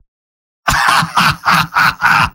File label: Spy mvm laughhappy